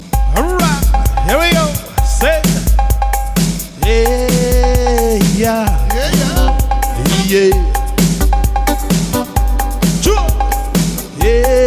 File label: Chamorro Chacha Mix